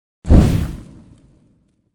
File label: fireball; foley